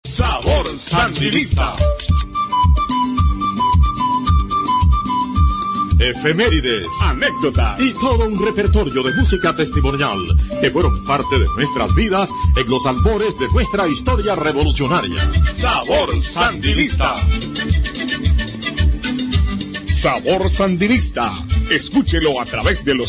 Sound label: Jingle